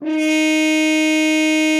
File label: D#4, FR HR MUTE; Xsample VOL-3